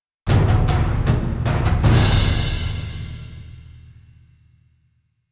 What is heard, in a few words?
Combat Out; Skellige